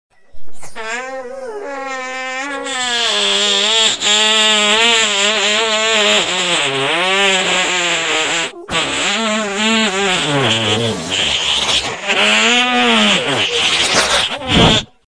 fart

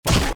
hit bonk

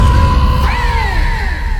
euaghhh

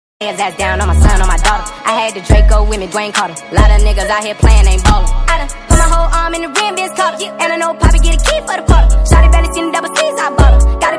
the box speed up

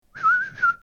whistle

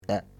burp smol

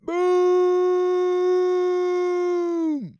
boooooooooooooom

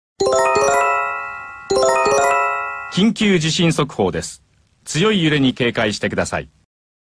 earthquake warning

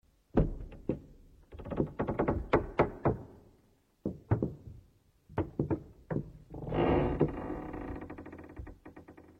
wooden floor creaks

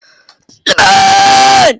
yell; lebron

